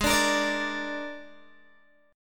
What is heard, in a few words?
Ab Suspended 2nd Flat 5th, down, Guitar, DADGAD; strummed; Absus2b5